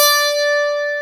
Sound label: 12 STR D5, PIANO; AKAI S-Series CD-ROM Sound Library VOL-8